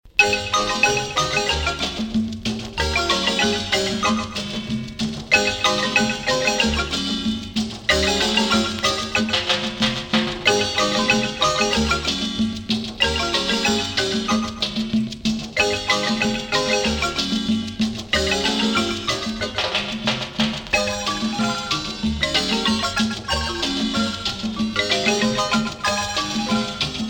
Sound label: Marimba orchestra of Chiapas